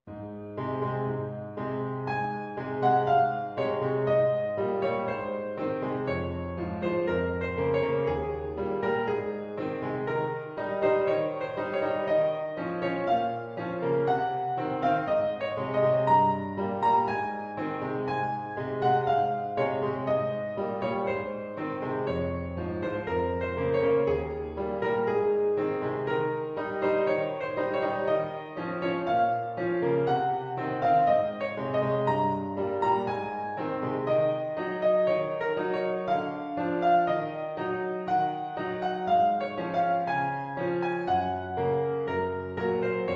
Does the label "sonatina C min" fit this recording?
Yes